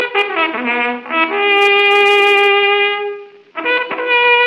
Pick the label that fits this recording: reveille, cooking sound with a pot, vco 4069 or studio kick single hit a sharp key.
reveille